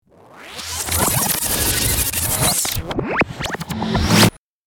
Time travel reverse whoosh

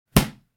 Boxing blocking a punch

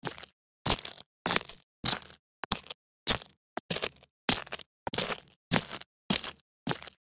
High heels fast walking on broken glass

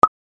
Clean notification info pop; shorts sound effects

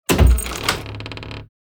Platform wooden game menu ui unlock item

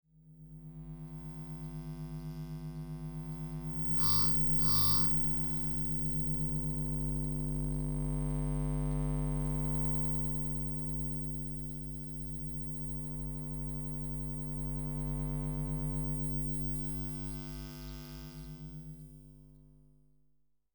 Multiple lamps and cables flowing energy textures

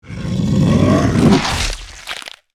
Werewolf bite flesh attack

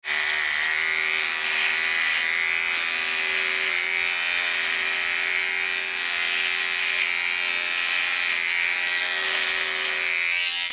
Electric razor beard trimmer

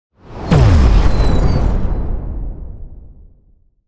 Intense shockwave powerful impact

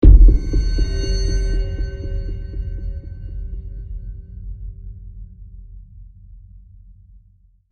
Cinematic trailer hit

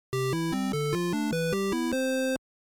Retro 16 bit or 8 bit success melody win victory

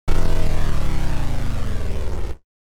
Sci-fi machine loading

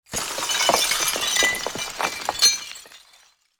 Broken glass rattle small shards

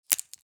Foil blister pack pressing tablet or pill out; medicine

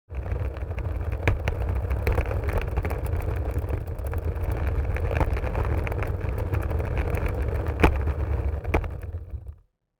Suitcase dragging rolling on wood floor

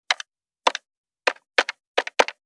Cash register checkout pressing buttons

